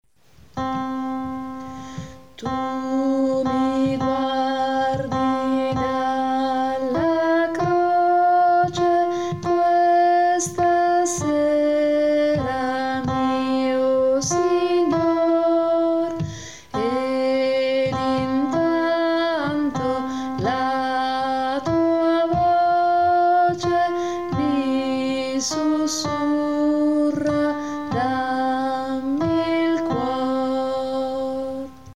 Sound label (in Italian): tu mi guardi dalla croce contralti